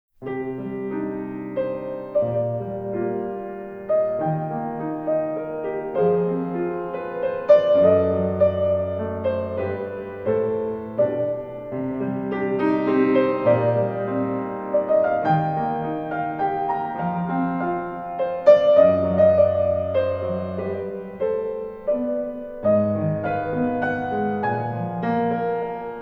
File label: Piano Improvisation; Devil May Cry 5